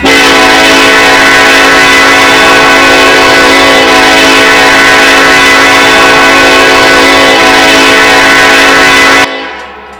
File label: k5lla, horn; run 8 v3 horn pack